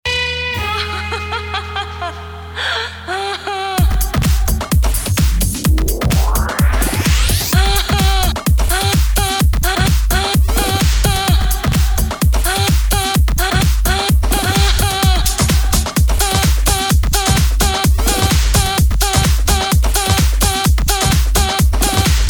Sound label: PRII PRYY TECHNO